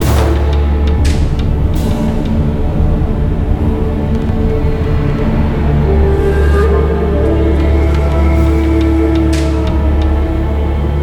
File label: Siege Field Melody